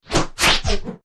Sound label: Quick Whips